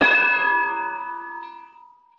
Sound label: carnival, Bells